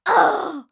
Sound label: voice Angry